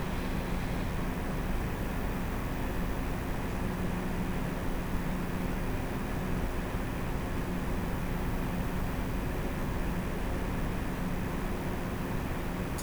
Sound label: Sound Record Torture